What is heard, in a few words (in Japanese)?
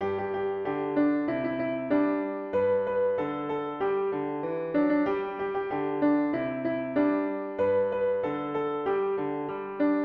ピアノ